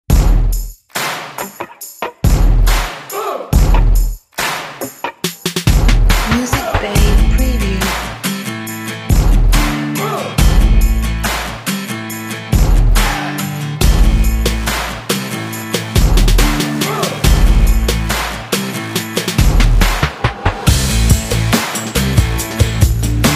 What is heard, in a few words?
Action Advertising Background